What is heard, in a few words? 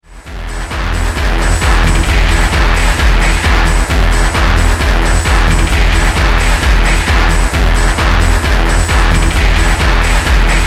instrumental version